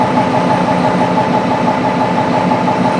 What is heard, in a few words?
Machines Large